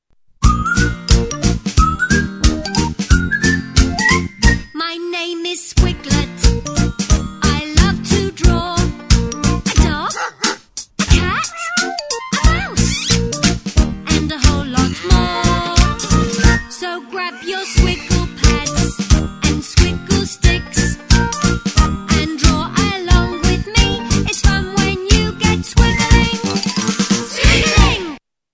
Squiggling

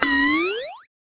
ringer; Ringtones

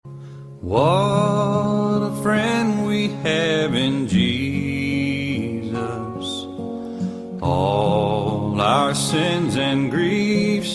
What A Friend We Have In Jesus Ring Tone

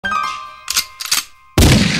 gun shot; Ringtones

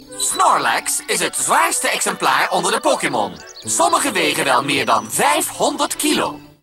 Snor lax

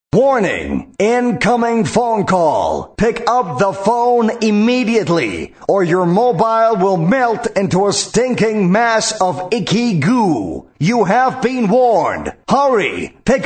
Warning Incoming Phone Call

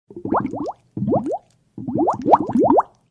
Water Drop Sms

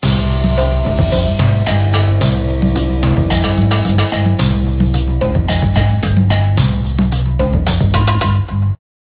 ringer; Ringtones

